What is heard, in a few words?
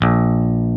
PICKED A#2, Classic Guitars; E-MU Formula 4000 Series Vol. 3; Analog Odyssey